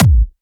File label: Trance Kicks